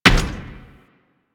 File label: Step Default, Footsteps; Warframe